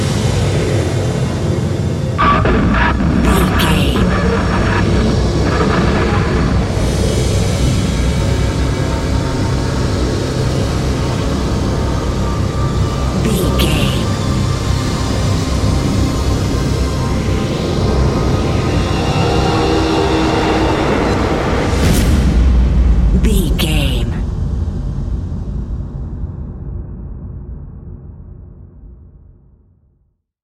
haunted demon

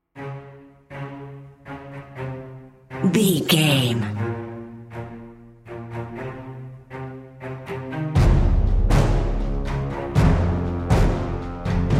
cinematic corporate dnb drum and bass mix

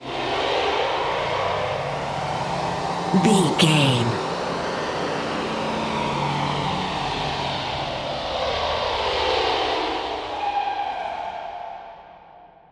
ominous war plane heard small cavern

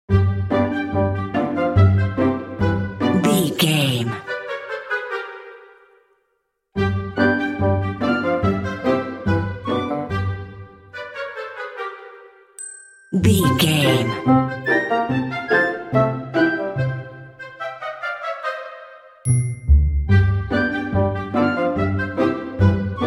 woodland critters play

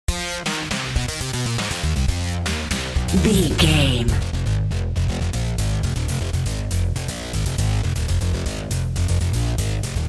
intense challenge video game

